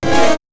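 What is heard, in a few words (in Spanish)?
sonido corto metálico y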